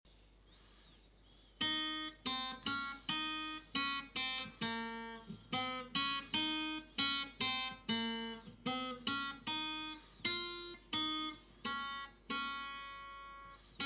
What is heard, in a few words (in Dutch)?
Gitaar